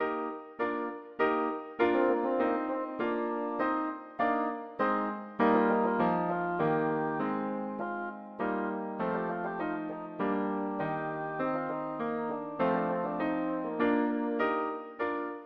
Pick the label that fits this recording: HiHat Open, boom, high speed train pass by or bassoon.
bassoon